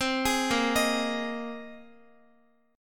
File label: A sharp 7th Suspended 2nd Suspended 4th, Guitar; A#7sus2sus4; arpeggiated